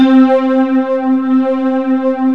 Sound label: nu choir, Yamaha W-7; Rubber Chicken Software Sample Bank